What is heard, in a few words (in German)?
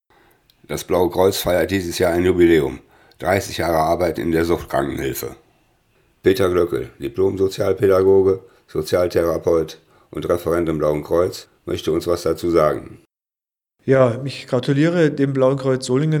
ohne Moderation; zur Selbsthilfegruppe im Blauen Kreuz; blaue welle; Solingen